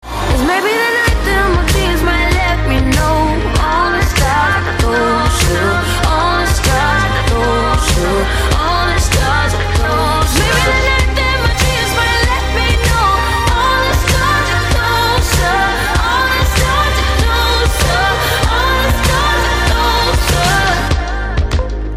english Ringtone

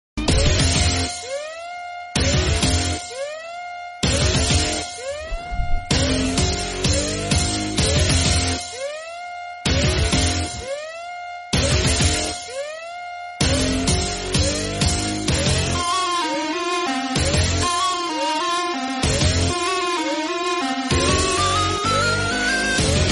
Instrumental Ringtone; Nani's Gentleman Movie

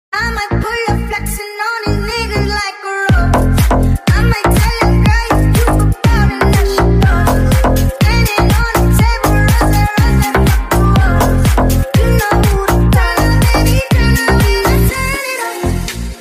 Remix Ringtone

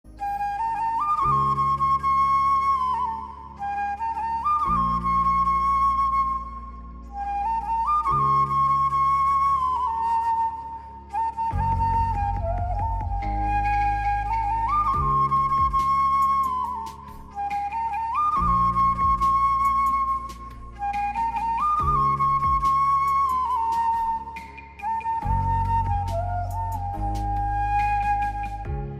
Flute Tones

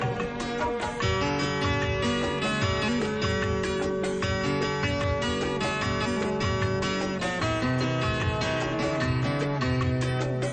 Instrumental Ring

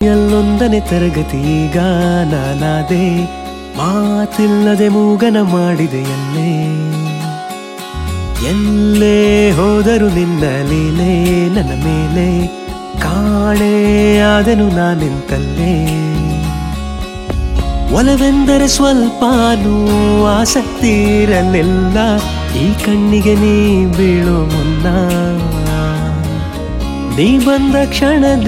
Kannada Song

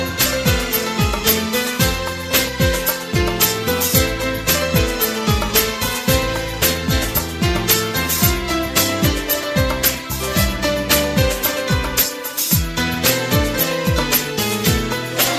Instrumental Tone

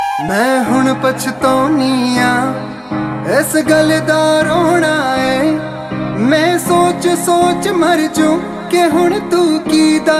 Song Ringtone

